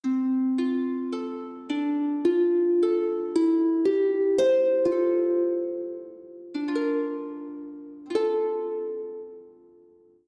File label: sing rite accompaniment loop